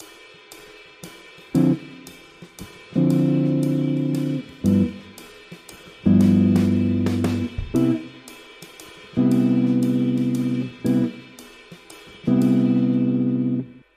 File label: audio jazz; ritmos de jazz